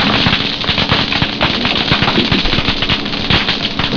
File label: snapping, aquaculture